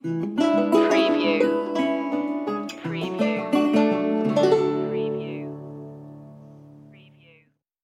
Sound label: red fern grows stinger